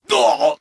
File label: Wound Crit; Valanar